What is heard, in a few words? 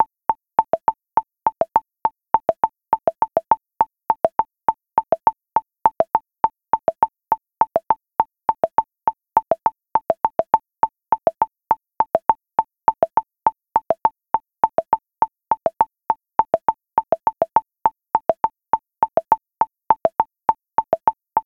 Underwater (Fast); New Super Mario Bros. 2; Leaf layer